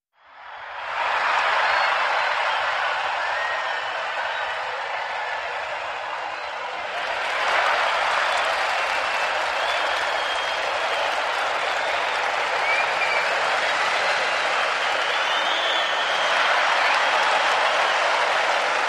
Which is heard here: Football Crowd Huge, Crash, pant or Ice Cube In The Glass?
Football Crowd Huge